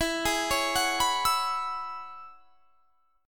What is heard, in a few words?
E 6th Add 9th E down; E6add9; arpeggiated